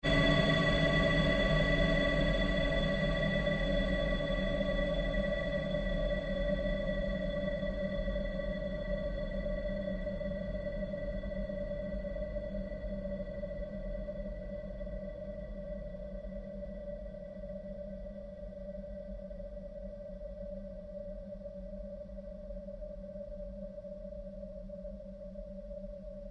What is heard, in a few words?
Created pulse fade long